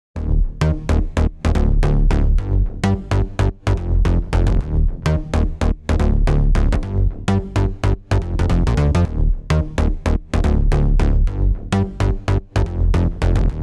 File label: bass clipper on; neutron 5